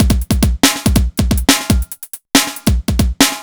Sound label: Synth Loop BPM140